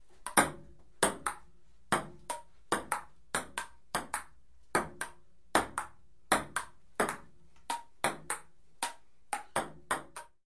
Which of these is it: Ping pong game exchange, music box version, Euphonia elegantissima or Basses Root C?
Ping pong game exchange